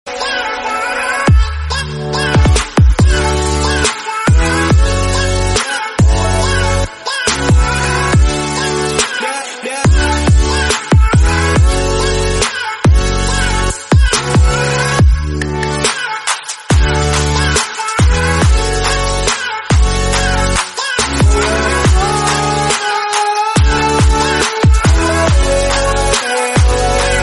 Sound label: amazing drop beat ringtone